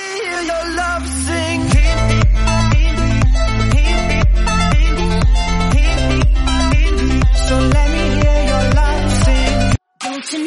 iphone original

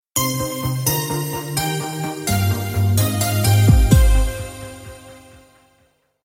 iphone 8 message tones

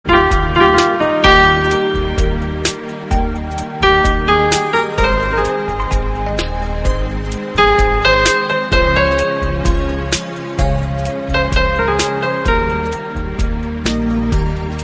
ringtone; Indian

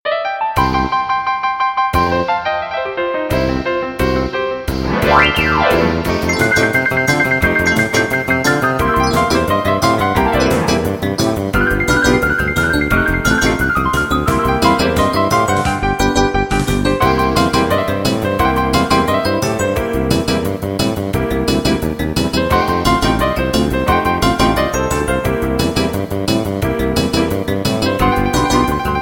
crazy best piano; Jazz

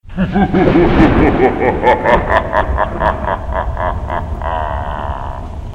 thunder laugh; Pop

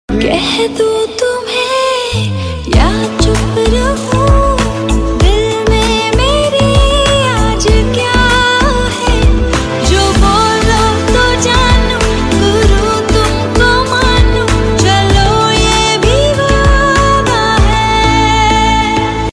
female ringtone; Bollywood

